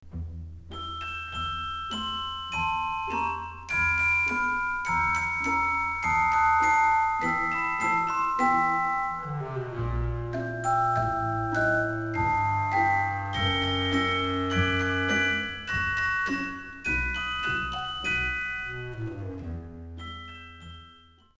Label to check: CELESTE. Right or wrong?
Right